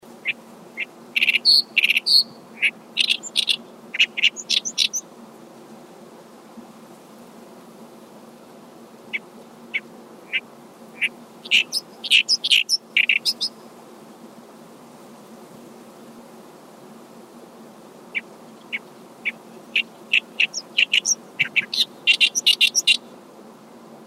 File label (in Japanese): oyosikiri